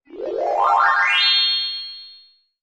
闪亮登场

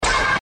惊讶

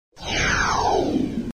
飞行

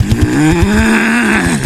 人物-愤怒

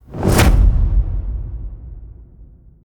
粒子爆炸

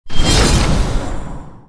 打斗-刀光（切割）

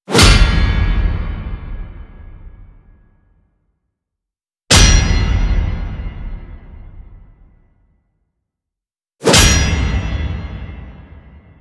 打斗-金属撞击

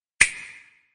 响指-时间静止

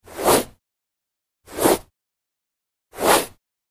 轻快的嗖

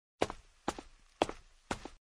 脚步-平板鞋

